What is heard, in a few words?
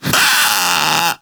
Vocal Pain, Infested; Splitter Split Top; Grey Strain